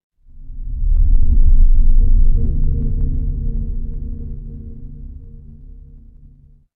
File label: horror drone